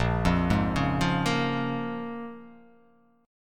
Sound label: A sharp Minor 7th, A sharp, down